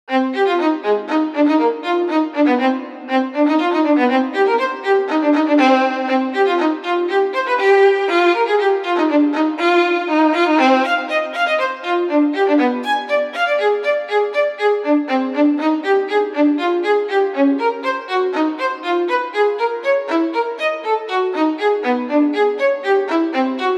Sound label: Spiral Jig